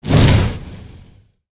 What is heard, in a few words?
Spinjitsu Fire Swing